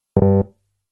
incorrect sound; super mario world

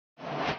ui toast out; minecraft

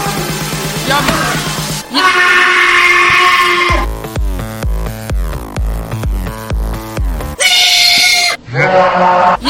ringtone

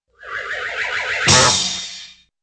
whirly twirl and fall

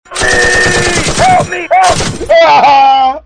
help me gunshot

